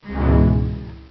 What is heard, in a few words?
nice note